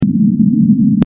Space station ambience

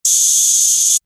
Tech gadget buzz

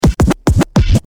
Turntable scratches

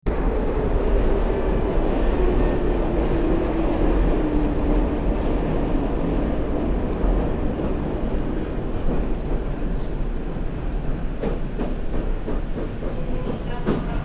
Subway ambience

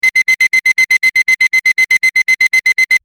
alarm clock

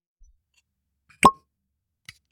Cork pop; Sound FX

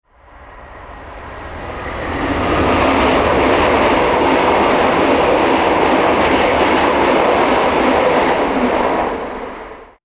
Train passing

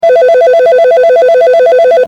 Phone ringing classic

